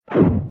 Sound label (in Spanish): rafaga; paper dash